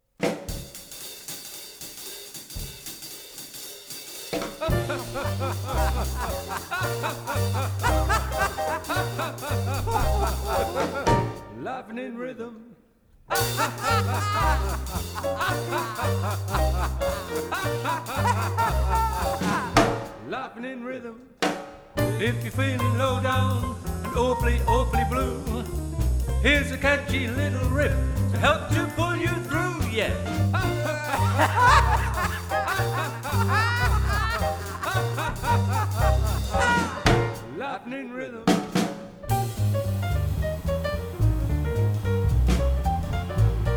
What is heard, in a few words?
Laughing, Xtract